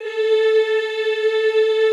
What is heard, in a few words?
EEH A3, Classical Choir; Best Service; Extended Classical Choir